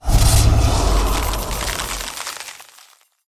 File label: bug